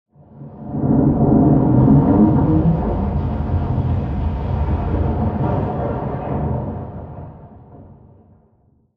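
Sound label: Explosion Rumble Interior Distant Tail; Boomtown; Titanfall 2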